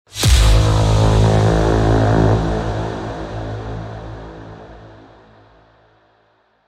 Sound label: impact braam tonal dark; biodynamic